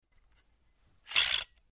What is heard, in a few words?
Extending And Retracting A Steering Wheel Lock; The Club Lock